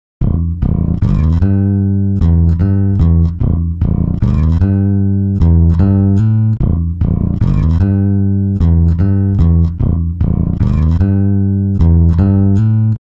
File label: bass; timestretch